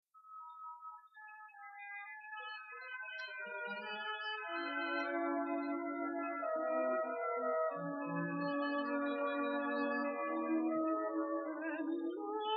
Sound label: air